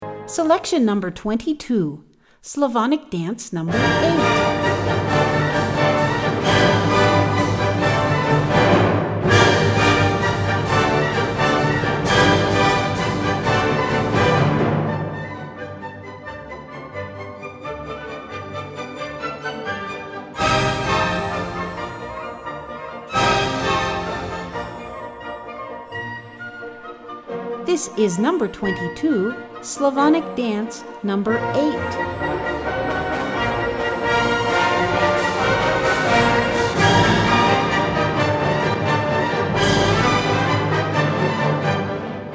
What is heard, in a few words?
slavonic dance 2, classical